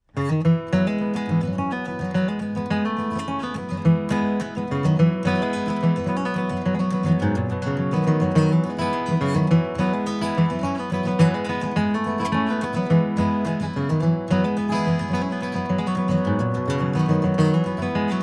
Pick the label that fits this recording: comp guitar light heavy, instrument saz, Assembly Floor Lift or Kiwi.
comp guitar light heavy